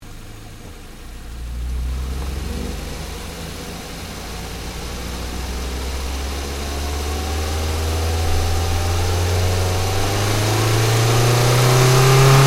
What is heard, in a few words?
Car Ford Focus Accel Drive Engine Bay; Ford Focus hire car; Cars; Vehicles